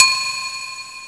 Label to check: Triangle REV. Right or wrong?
Right